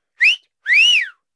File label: whistle